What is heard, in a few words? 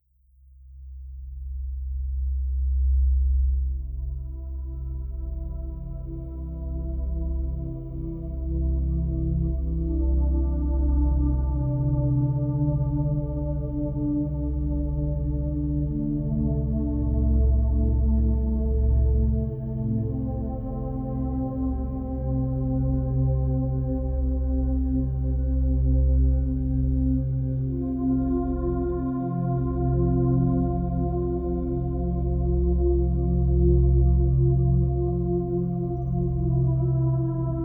Heavy Rain Sounds Relaxing Spa Music Meditation